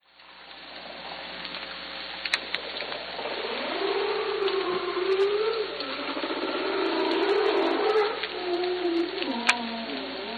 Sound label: humpback